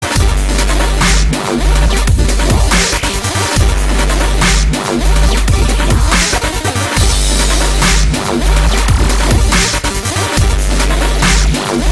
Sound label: Popping Beats, percussive pops